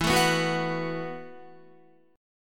E Suspended 2nd Suspended 4th E, Guitar, 4F,4A,5C,5G,5C,6E; strummed; 11,12,11,11,11,14; JGuitar